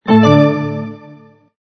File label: you win